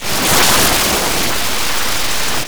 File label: NEW SEA 2, granular; Disjointed Textures; USB Soundscan vol.33; AKAI